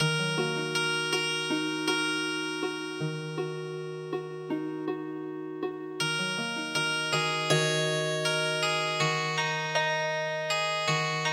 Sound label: gung cho daeng gi Healing Harp